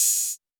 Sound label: Hats, Closed Hats